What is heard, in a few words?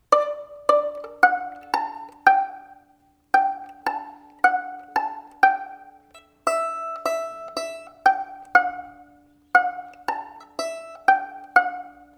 played on violin stereo